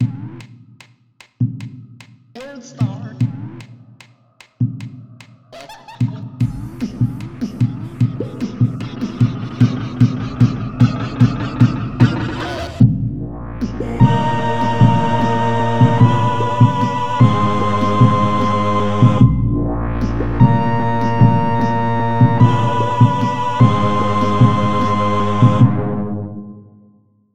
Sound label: purely samples